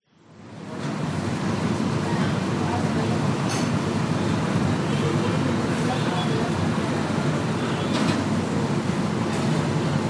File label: alleyway behind restaurant fan chefs voices singapore